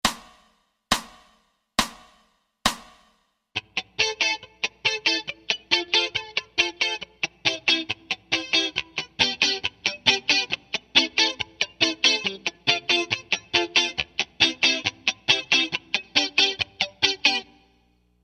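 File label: Rhythm Guitar Ska 16ths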